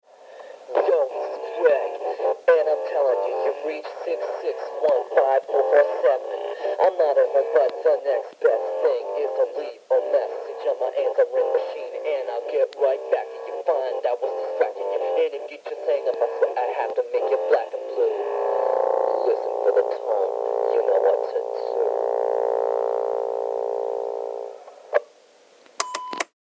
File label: phone message rap